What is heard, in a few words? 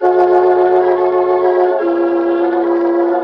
organ leadin; Loops; Electronic